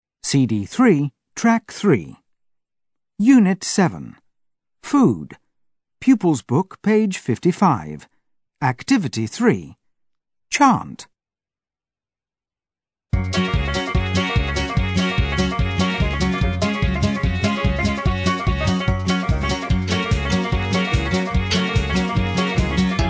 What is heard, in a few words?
food chant; summercamps